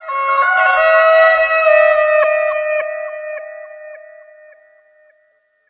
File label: VIOLINS FX; USB Soundscan vol.60; Asian Traditions; AKAI; VIOLON FX